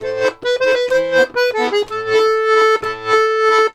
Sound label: Accordions; USB Soundscan vol.40; AKAI